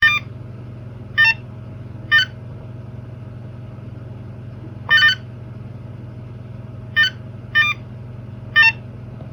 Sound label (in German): blipp bereinigt